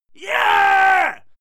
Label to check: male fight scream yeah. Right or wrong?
Right